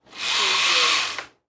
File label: Window Blinds Raise; sound effects bible